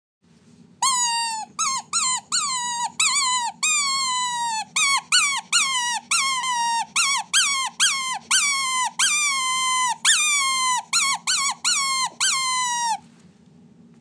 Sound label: Distress, fox call